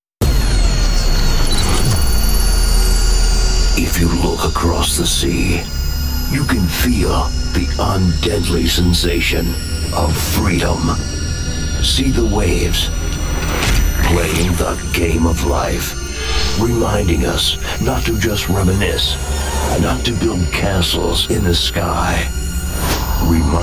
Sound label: time for real retro house